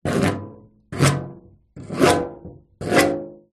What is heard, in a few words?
metal object scratch rusty metal surface; foley